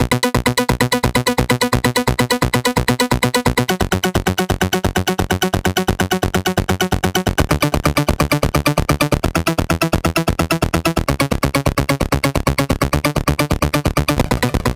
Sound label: Bass, EDM; Sonic Foundry (Sony Creative Software); Afterhours EDM